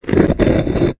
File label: foley large rock movement; zapsplat